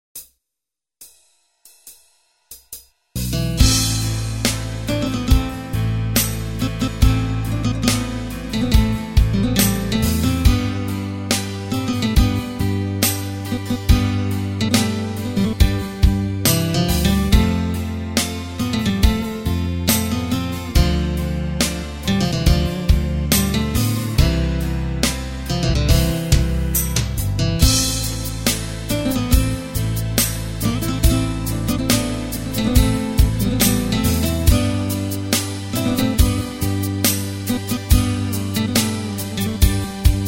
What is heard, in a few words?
8-BEAT